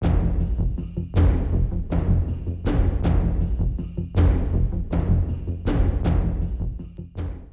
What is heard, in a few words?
Doors Open Loop